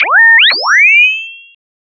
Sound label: High frequency alert